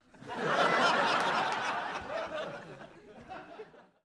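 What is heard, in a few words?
Crowd 100 Medium Burst Interior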